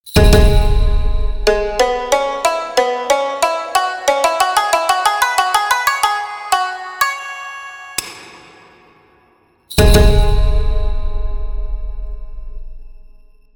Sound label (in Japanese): 和ジングル